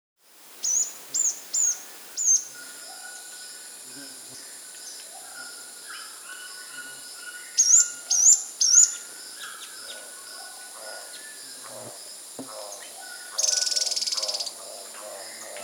Rufous-Tailed Antwren (Myrmotherula; S. America

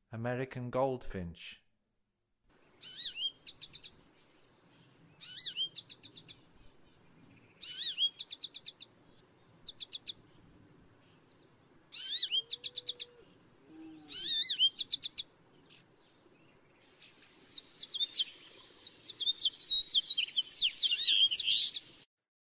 American Goldfinch; North America

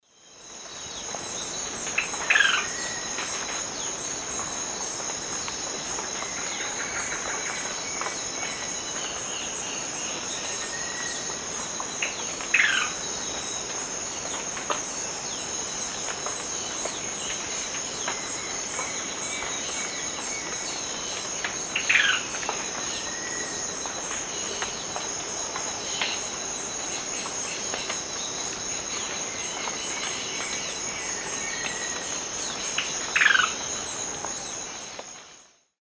Poecilotriccus latirostris, song; S. America; Eastern Lowlands

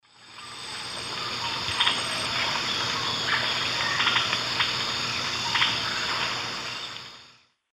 Dendrocincla merula, calls; S. America; Eastern Lowlands

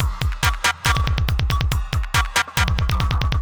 ind 140 kit

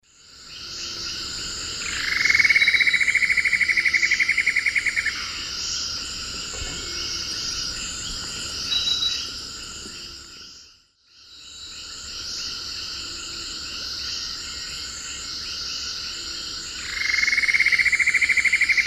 Dendrexetastes rufigula, song; S. America; Eastern Lowlands

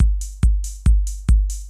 techno 140

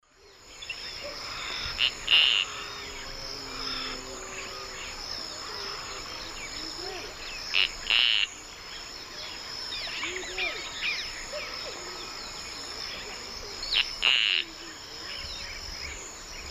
Synallaxis propinqua, call and song; S. America; Eastern Lowlands

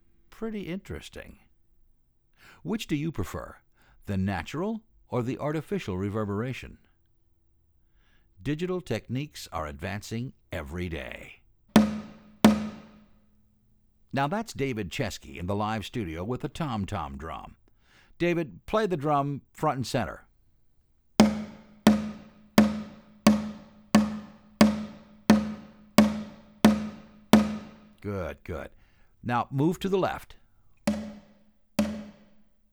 Natural Stereo Imaging

